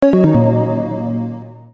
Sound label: event mild big jingle